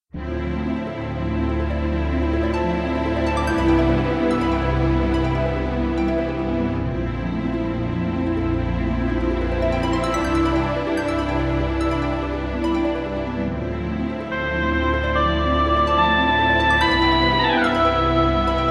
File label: dungeon